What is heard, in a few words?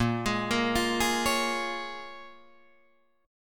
Bb Minor 7th, Guitar, Drop D